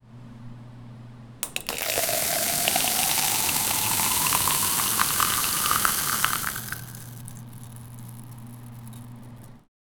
commercial soda, beverage; make a commercial soda

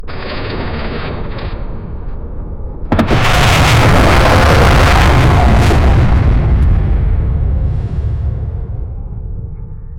ship

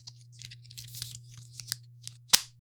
opening a pack of trading

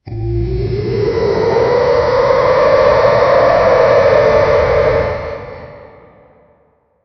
mighty t rex roar like in

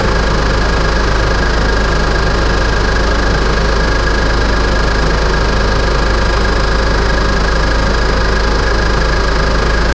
marine diesel engine goin

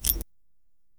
collecting diamonds

